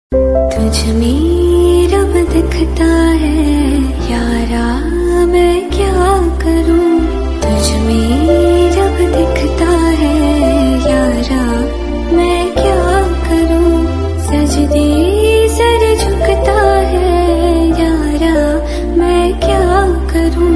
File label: Female Version Ringtone